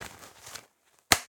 Blue PPE Mask Elastic, Mask Foley; InMotionAudio

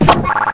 button; hl2; buttons

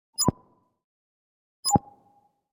UI Error; Kpow Sounds; UI SOUNDPACKS

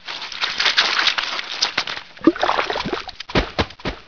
barnacle die; hl2

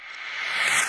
drone, turret floor; hl2

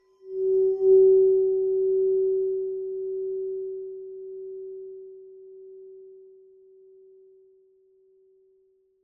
Crystal, Glass, Red Wine, Tone, Rubbing, Musical; Raconteur Sound; Crystal Glasses- Tones and Drones

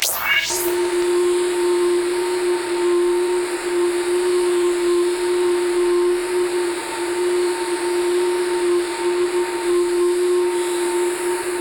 Hologram designed, Cyberdeck; CB Sound Design; Hologram & Transmissions Sound Effects

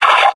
kick; combine soldier; hl2

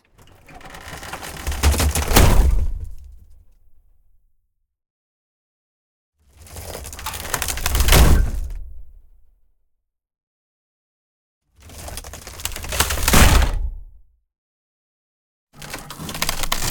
BROKEN DESIGNED WOOD Break Small; Rock The Speakerbox

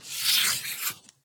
Kid's Board Game, Shotgun, Pawns; UberDuo; Game Night Audio Props; GAMEBoard

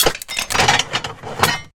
steampunk machine mechanical texture; BluezoneCorp; Steampunk Machines

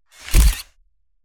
Camera Shutter Click Design Rise And Quick; Stereo; 2496SoundEffects; Hollywood Cameras

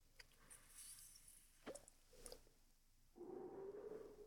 Human, Adult, Male, Smoking, Inhale; UberDuo

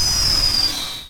vehicle turbo off; hl2

